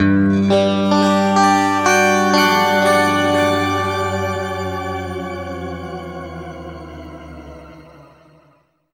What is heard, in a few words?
G P-S, guitar chords; East West; Steve Stevens Guitar Samples Collection